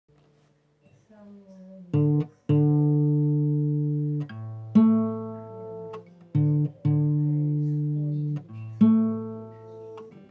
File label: Guitar Attempt